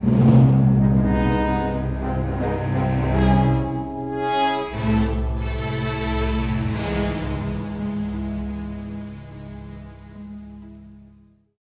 tension, music

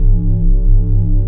kejim console; ambience

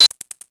bomb beeping; Max Payne

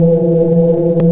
fast, engine; ships; Quantum Redshift; XBOX; tara

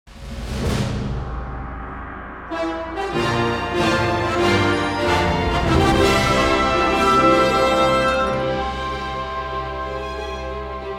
Heroic Resolve; Character Indy; XBOX

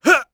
attack voice; UFC Tapout; XBOX

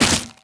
hit body; impacts explos

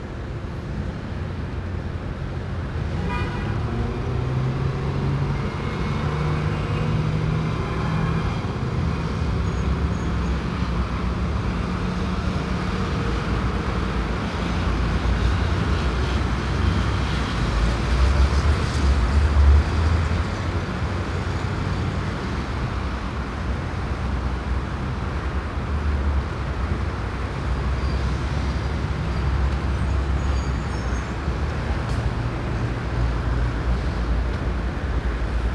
ambience; Street Hoops; XBOX

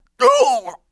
Bum HURT; TrueCrimeStreetsOfLA; XBOX

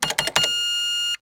Cash Register no access; Max Payne

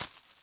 hand grab ivy

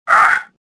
land; swamp2; Star Wars Jedi Knight II Jedi Outcast; XBOX

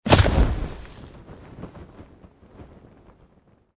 chute land; Soldier Of Fortune II Double Helix; XBOX

